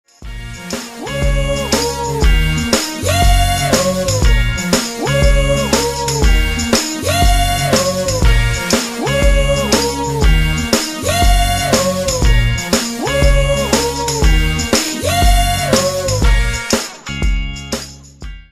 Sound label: Instrumental